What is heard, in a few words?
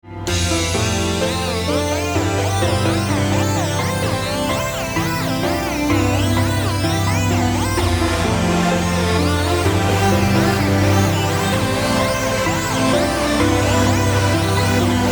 TRANCE IS LOVE